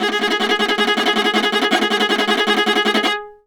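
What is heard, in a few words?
viola tremolo G#3; Orchestral; DirectWave; Varazdin Orchestral; Viola II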